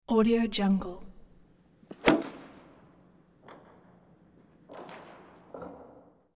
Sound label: golf swing with iron at indoor driving range